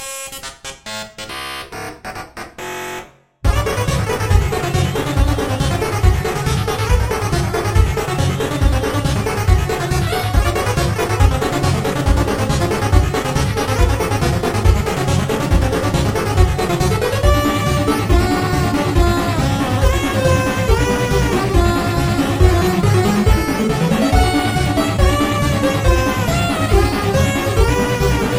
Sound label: metal madness